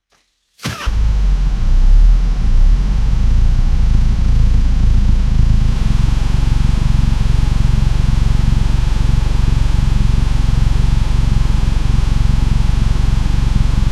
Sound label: v type 2 cylinder ohv for, exhaust